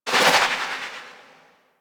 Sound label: Fairy Bullet Jump; DE; Warframe